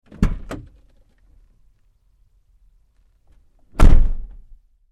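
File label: Truck GMC Savana Door Interior Open-Close Hard; 2010 GMC Savana; Big Room Sound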